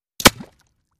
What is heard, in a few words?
Bullet Impacts Water